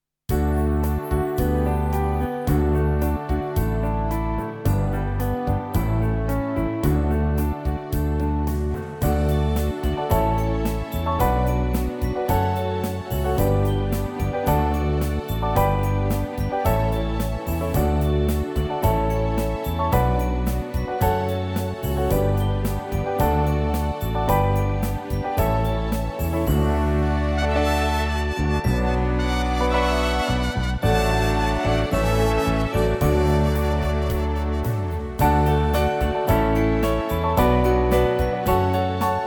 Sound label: winter and christmas music pop orchestra and piano